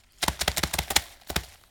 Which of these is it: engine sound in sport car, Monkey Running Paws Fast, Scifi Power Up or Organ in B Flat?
Monkey Running Paws Fast